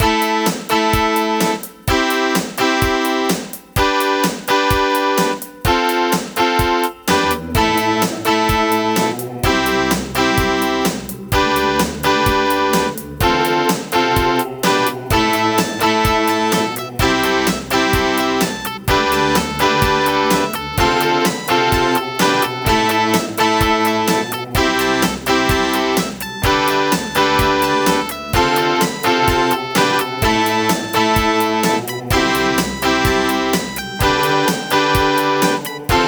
LG MIDI ART GS1000R; raptor call of the shadows

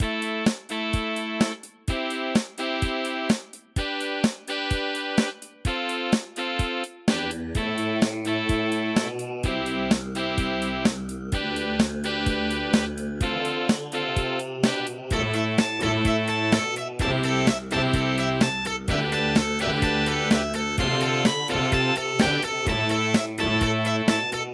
Wave 1 [Yamaha DD-55]; Raptor

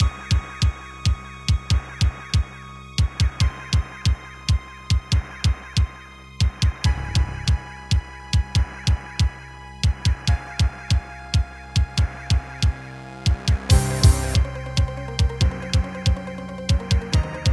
Yamaha MU5; sigil doom episode 5

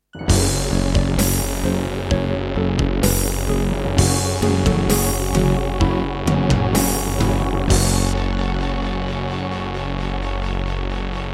Introduction, Gallant GMW-1000; Doom (Sigil)

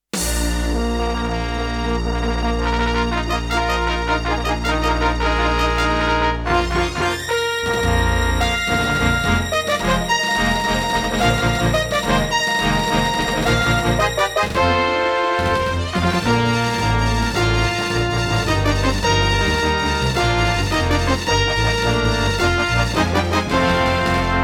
Intro, Serdaco Dreamblaster X16GS NATIVE; Dark Forces

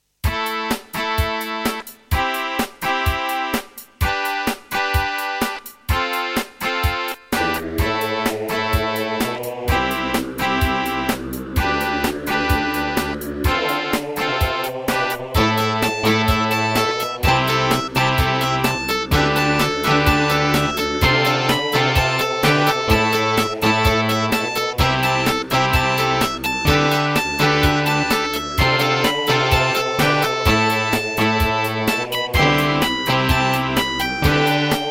Korg NS5R; raptor call of the shadows

